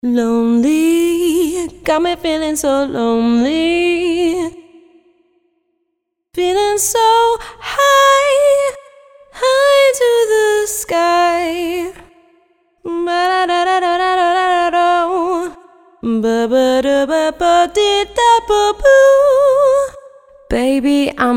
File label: Smooth Vocal Hooks